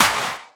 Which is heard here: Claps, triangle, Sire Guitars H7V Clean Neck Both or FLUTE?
Claps